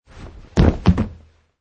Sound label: Landing